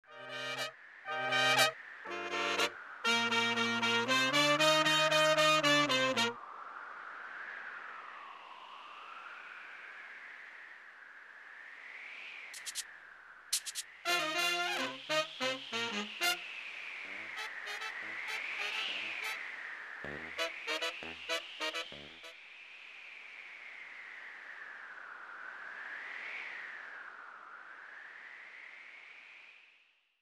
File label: Swinging Fanfar; swinging fanfare